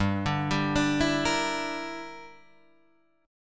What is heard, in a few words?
G Minor 6th, Guitar Open D